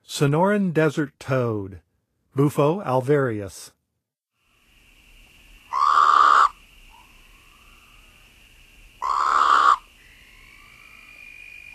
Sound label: Sonoran Desert Toad; North America; Songs of Frogs & Toads of North America